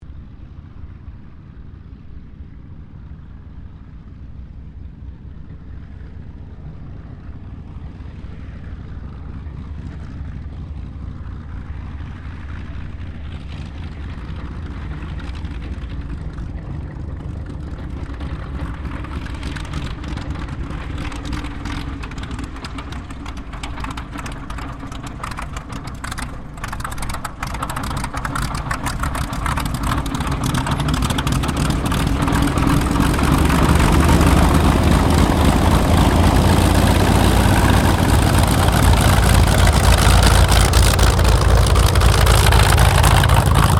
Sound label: AIRCRAFT HAWKER SEAFURY TAXI, Hawker Seafury fighter-bomber; Airplanes; Vehicles; Aircraft Collection; Twistedtracks